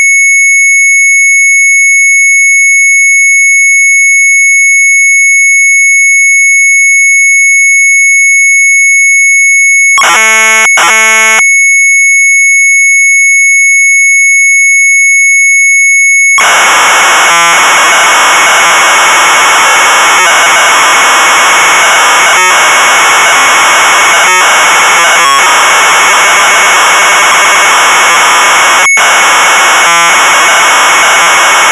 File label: MZ800 Tape, sharp; STAMP OUT; retromelsarchive